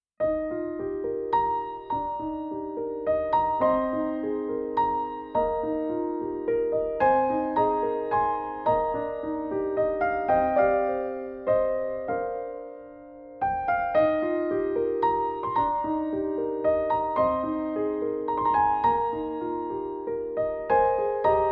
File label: Piano; First Service